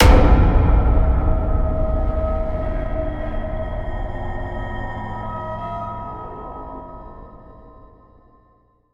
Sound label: Event Game Over; Inferno Climber